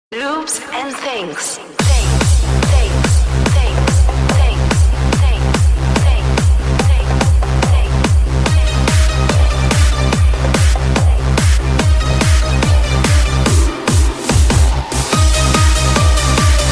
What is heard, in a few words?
trance loop